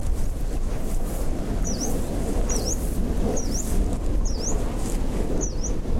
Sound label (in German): gelbbrauen-laubsaenger